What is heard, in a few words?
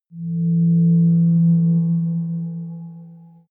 synth wave, sweeps and swells